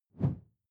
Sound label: whoosh simple slash big low; swooshes-swishes